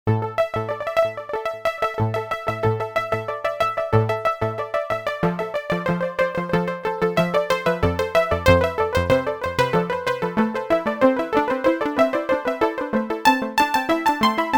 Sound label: Analog Seq, Roland JV-880; Factory Patches